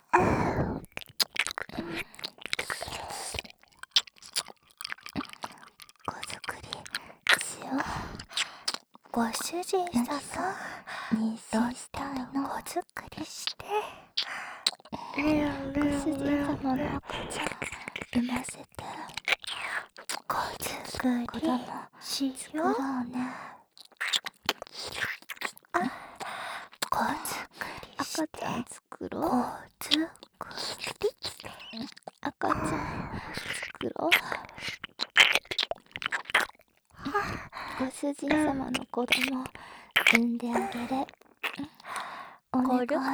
耳舐め

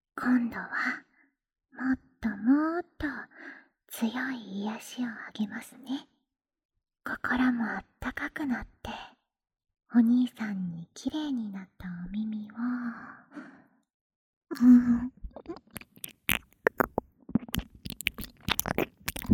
エッチで癒しな耳舐め

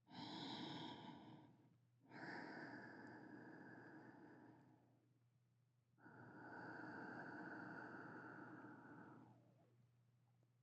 寝息 (ループ用); 御未子さま

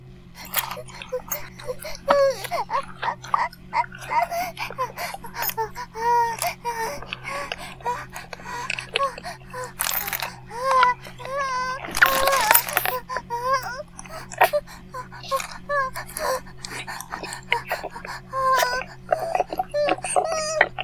バイブ放置; イクたびにわけて

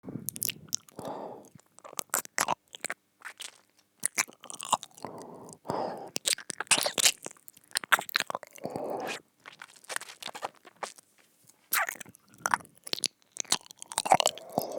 耳なめ ループ 左耳用; 男ふたなり食べ放題！見た目はショタで中身は３００歳の天使様が僕の童貞を奪ってくれました

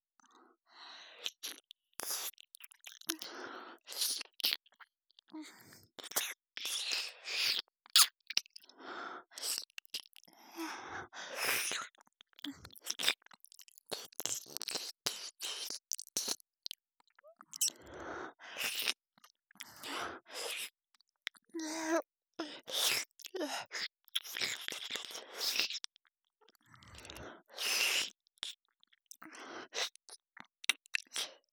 ねっとり ゆっくり とれろれろ 耳舐め

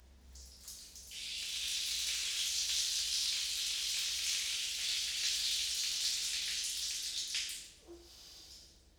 おしっこ お風呂場 洗面器 バイノーラル; おしっこのみと編集版

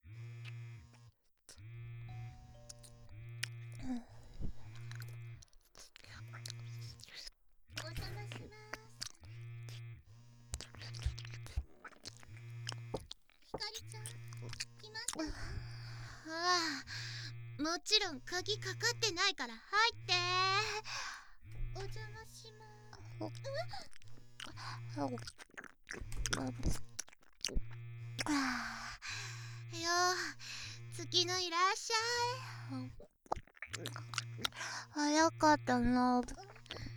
両耳舐めでの調教しあげ; みみマゾ調教-妹の友人を襲った罰に耳だけでイケる耳舐め射精奴隷にされてしまう音声

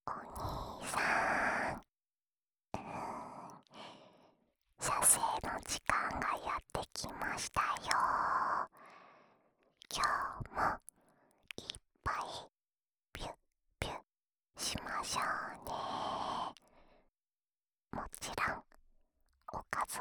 オナサポ用耳舐めループ L, ASMR

